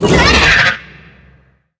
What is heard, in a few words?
pain; gonarch